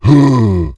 jump; ogro

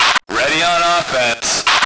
radio; lmctf; andromeda

